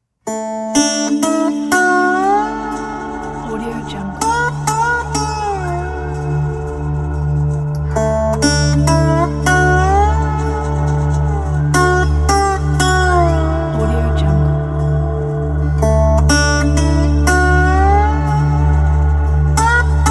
desert slide guitar

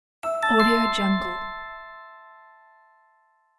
mail; winds productions

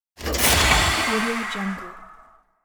hydraulic mechanism

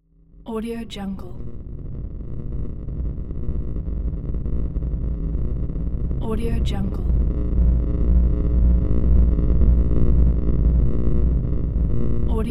long electric drone

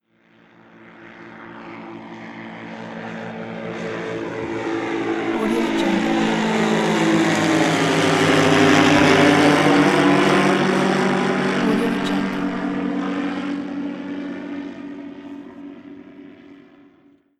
propeller driven drone flyby

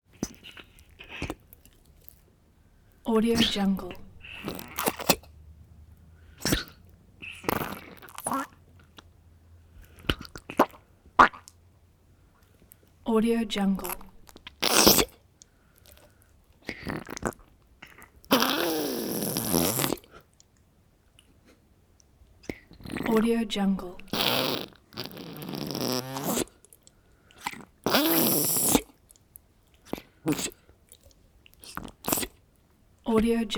gross sucking sounds constant

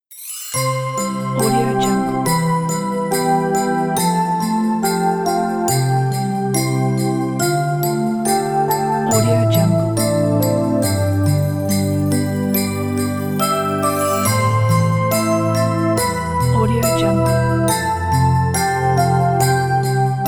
christmas ident

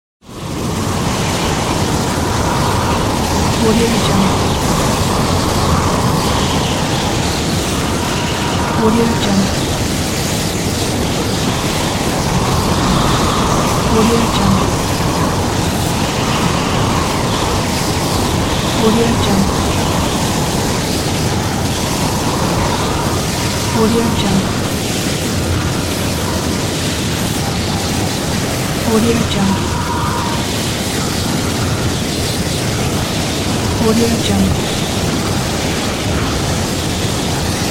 tornado

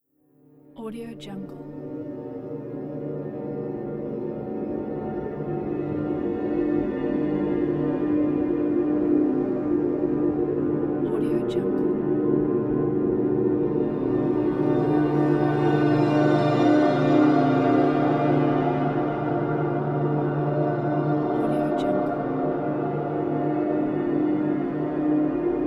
horror ambience; circutreeaudio

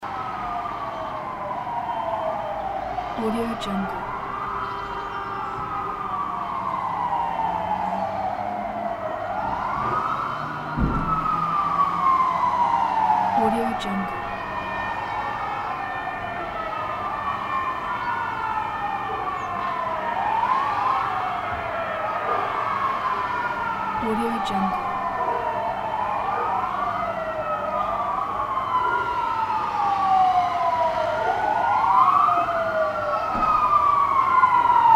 distant emergency cars sirens loop; fxprosound

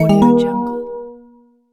positive notification; wildemotion

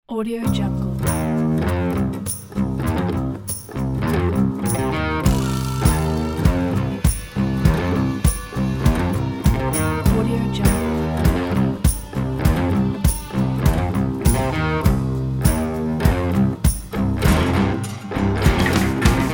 country rock

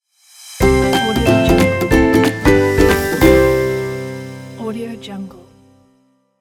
happy logo

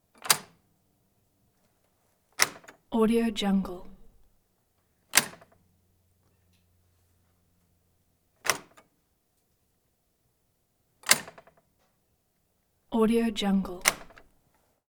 deadbolt door lock sliding metal clicks

